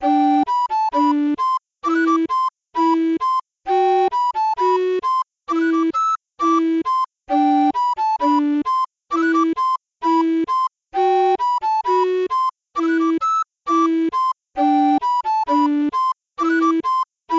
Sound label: bgm recorder; liarhouse